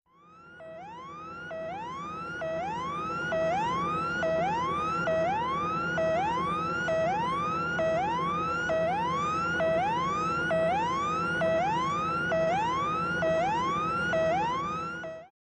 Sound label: UH-1 Huey: Cockpit Interior, Low Rpm Warning Alarm